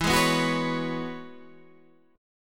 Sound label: E 6th Add 9th E, Guitar Standard; E6add9 strummed; JGuitar